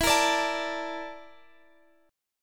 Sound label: Bb Major 7th Flat 5th